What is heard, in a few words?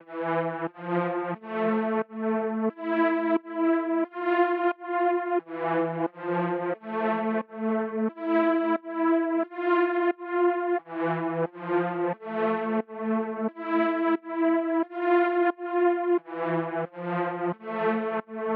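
89 BPM D Minor Lead, Latin; Melodies; Loop Stems; Blanco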